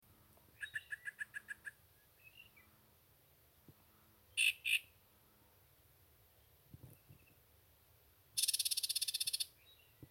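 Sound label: sounds of icterid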